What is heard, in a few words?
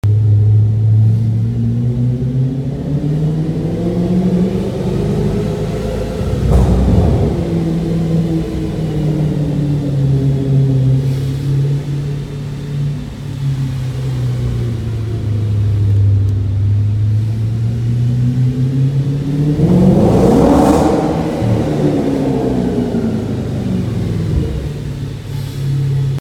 parking lot